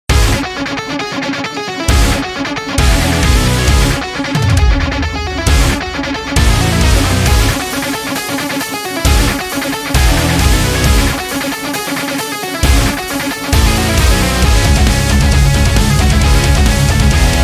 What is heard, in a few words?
Heavy Metal Music